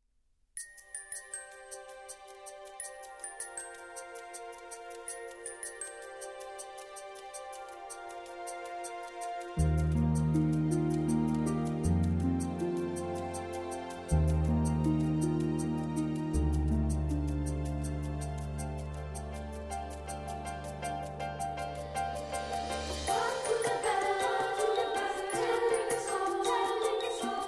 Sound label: Ukraine Bell Carol; Shchedryk; Ukrainian trad. arr. Brett; 圣诞节Christmas